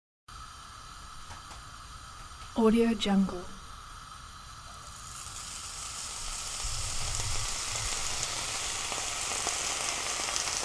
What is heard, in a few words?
grilling meat in a pan